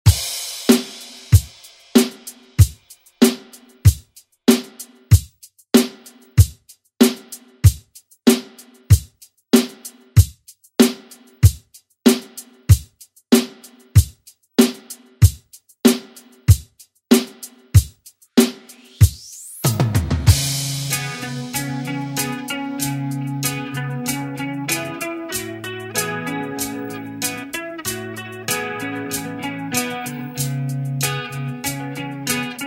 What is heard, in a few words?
80s Re Drum; DJ Mhark; remixplanet